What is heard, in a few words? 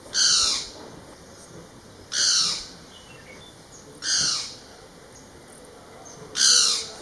Great Barbet calls, Sarangkot Gandaki Zone Western Region Nepal